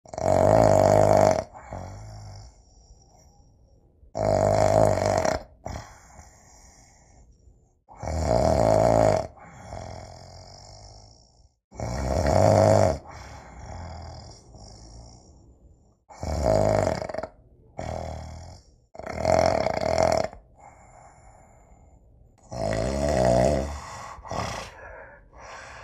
hrap

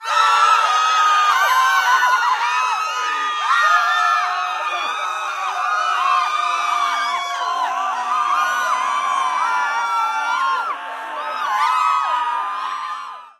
krik tolpy; Толпа кричит от испуга, восхищения, негодования

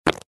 puk cheloveka

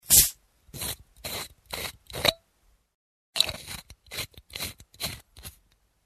otrk zakr gazirovku

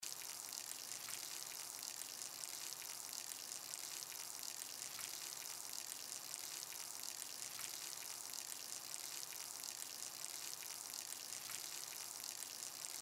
lietsia tonkaia str vody

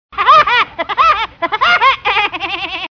smex; vudi vudpekera

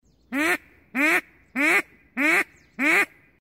kriak utki dlia ohoty

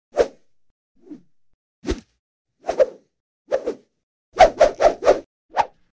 svist pri vzmahah rukami i nogami karatistov

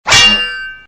udar truby

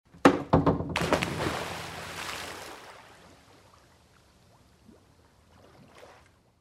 zvuk pryzhka v vodu s tramplina

